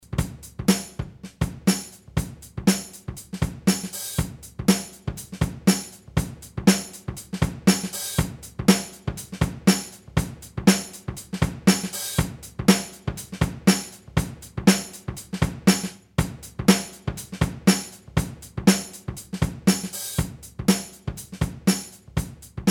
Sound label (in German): Beat Akustik BP Boost Cut; Pokket